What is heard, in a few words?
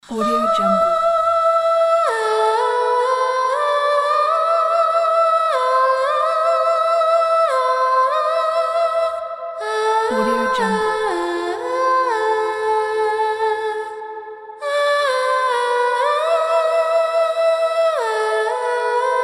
swan lake female vocal